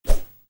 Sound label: fire Noise; yumpod